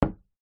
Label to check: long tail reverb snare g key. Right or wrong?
Wrong